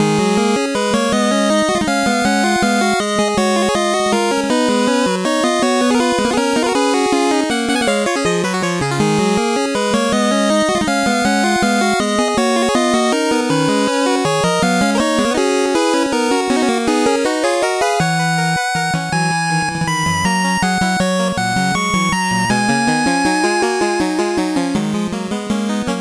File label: 8bit bgm slow